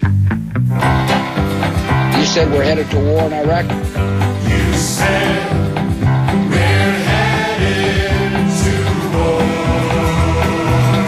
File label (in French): Chanson anti Bush